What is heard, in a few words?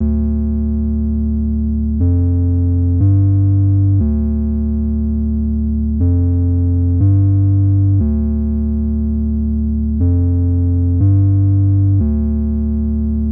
music bass